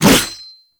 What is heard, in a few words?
Whoosh, Weapons; Infested; Coda Mire